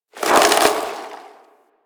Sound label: Worker Ice Lever Pull; ambience; props; one offs; Warframe; Venus Open World; Venus Solaris United